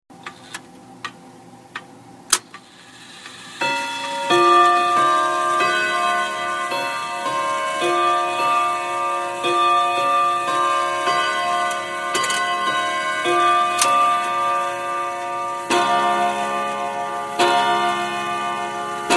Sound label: hermle wall rod westminster